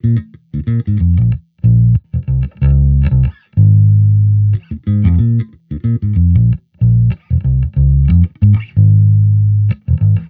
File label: Amped loop 93 Bbm, Electric Bass Loops; Slate Digital BASSHOLE Sample Pack